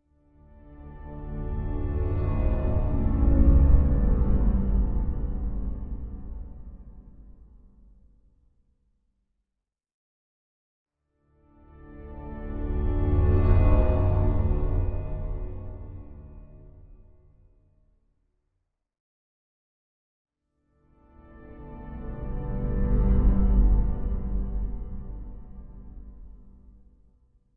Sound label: Music Eerie Soft Orchestral